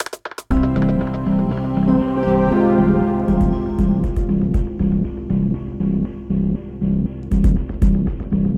Suit Up Zipper 4 Bar, 1 4 Note Pickup 2 Bar Loop 119 Bpm; gamerip